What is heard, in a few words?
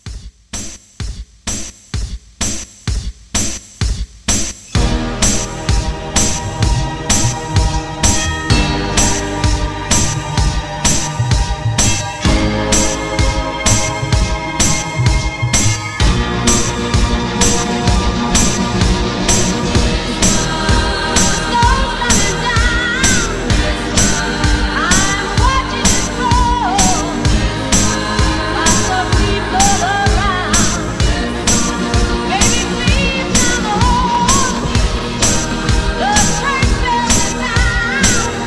60s Holiday Re Drum 128; Christmas